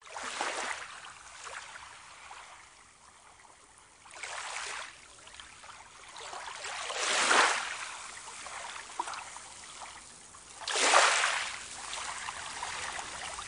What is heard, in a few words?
Wavelets, alarms